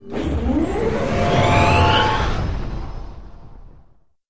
enter hyperspace

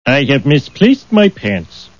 pants

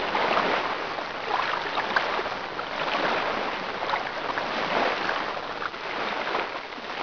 water wave

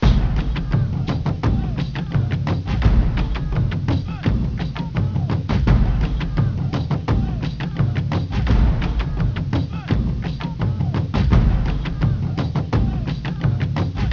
drum loop

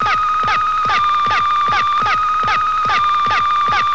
sensor viewer; trek

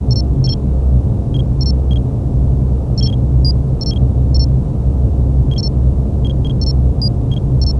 sickbay treatment; trek

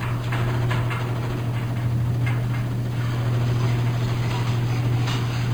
machine

